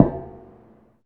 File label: footstep metallic; The Swapper